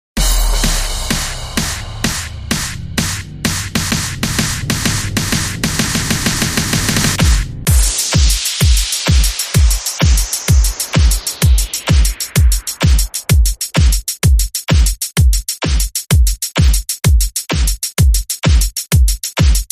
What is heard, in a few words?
Beat